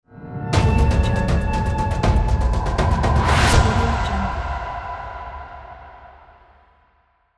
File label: survival logo; monochromeeffect